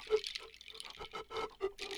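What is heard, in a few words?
Music Village Calm Near; From Dust; ps3; xbox 360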